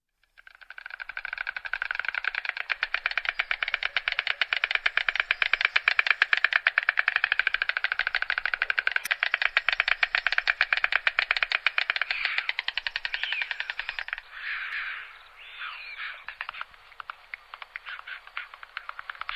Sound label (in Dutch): Ooievaar, vogelzang; Cursus Vogelzang